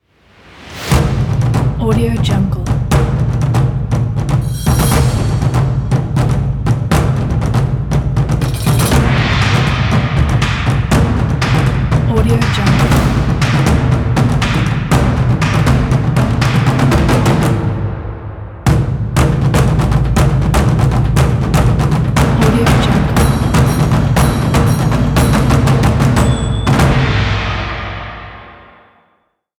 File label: tribal stomp